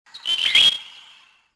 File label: bird; yavin